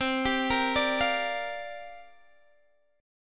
C 7th Suspended 2nd Suspended 4th C down Guitar Open D